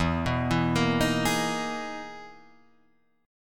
E Major Sharp 11th E, Guitar, Drop D; chord shape

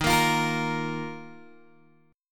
D sharp Suspended 2nd, Guitar, Open G

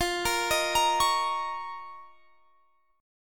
F 7th Suspended 4th Sharp 5th; arpeggiated

